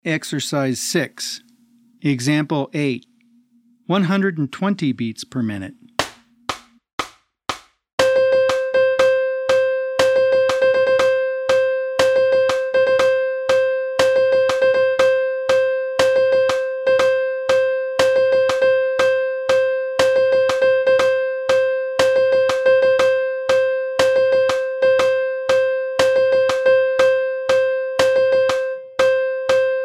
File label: 120 BPM